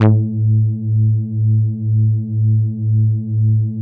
Moog Taurus, A2; Big Fish Audio - First Call Vintage Keyboards

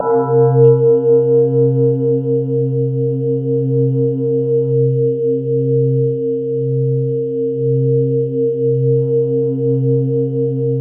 BELL EPIC

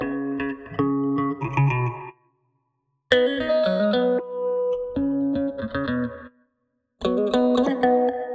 Emaj Gtr Tweaked; Zero-G; Future Wave

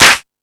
Discoball, drum machine; E-MU Pro-Cussion-1

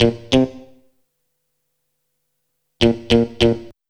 FK GTR, FUNK; USB Soundscan vol.47; AKAI

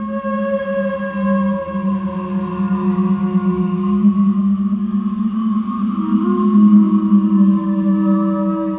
ambience; Sonic Foundry; Sony Creative Software; Ambient Grooves

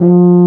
F tuba F; Best Service; Alpine Volksmusik

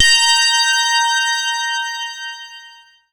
BELL-GONG, A#; Big Fish Audio

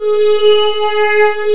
DIDI PAD, SYNTHESIZER; AKAI S6000 CD-ROM - Volume 1

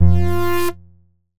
Hit, Stab & Hit; Bitkits

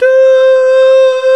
VOX, Male Scats

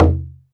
Perc, Alesis DM5

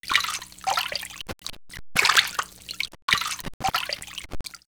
Nature Small Splash; X-Static Goldmine

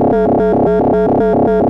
Moog C2, Synthesizer; Hollywood Edge; Giorgio Moroder Rare Synthesizer Collection

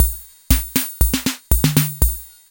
FILL, KR55; INIS - Funky Xtreams

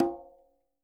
Quinto, Percussion

